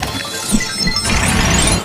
minigame instruction build success; LEGO Movie Videogame; PlayStation 3

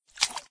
Puddle; Doodle Jump 2

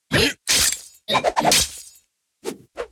Azari Spattack

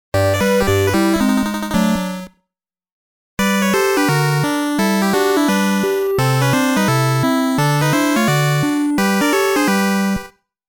Miss ~ Game Over; Game Sound Museum Namcot; Mappy; Mappy Land; Mappy Kids

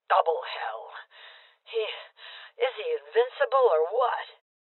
Voices Heavy Hit Female Old; rebel cops 2019 gamerip

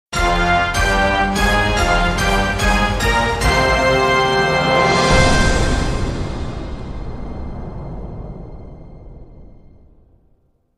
Out Of Commercial Break; Who Wants To Be A Millionaire

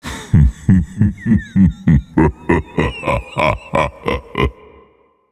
Vocal Laughs; Minecraft Story Mode; Colossus

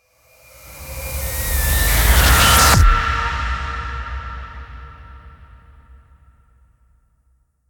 Main Ambient; Blood Harvester

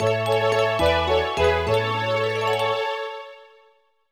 Fanfare Repair; nintendo ds; Disney Fairies Tinker Bell

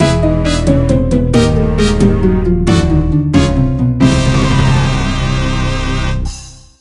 loss; Burthorpe Games Room

